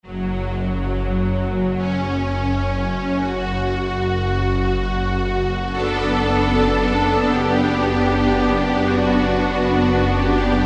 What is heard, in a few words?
Layer Str